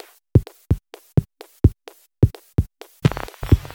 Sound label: Electro Loop 128 BPM; Vengeance Samples